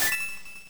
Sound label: shing; pokerogue